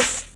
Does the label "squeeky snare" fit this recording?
Yes